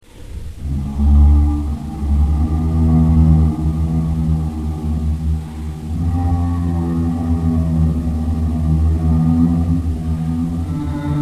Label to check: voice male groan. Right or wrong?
Wrong